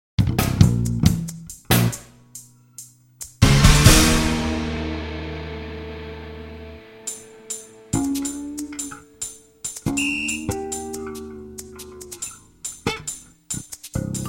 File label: Drum Ddu